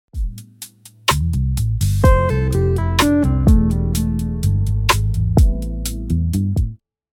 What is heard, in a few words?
Hammer Ons and Pull Offs, guitar